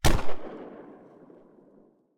revolver single fire; ranged weapons guns; psfx

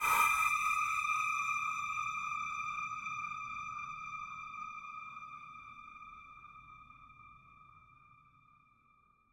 atmospheric element tension; sonniss gdc

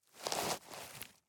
footstep step dry grass skid drag; Sonniss GDC

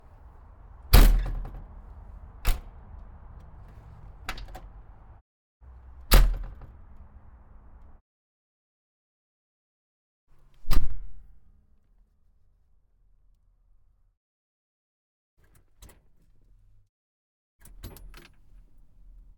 volvo l90 wheel loader door; mkh8060; Sonniss GDC

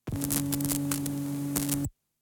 ui glitch analog glitch broken walkman noise

